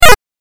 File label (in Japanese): 8bit キャンセル